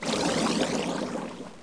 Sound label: FIS WISH; Mr. Wonder's Greatest Toyshop on Earth; OmniMedia